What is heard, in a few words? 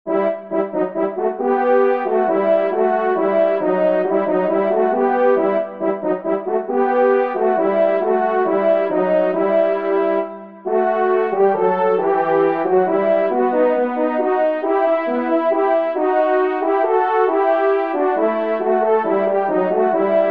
DUO Fa b Maj; Kling; duo cors n 23